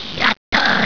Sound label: pain; alienarena; grey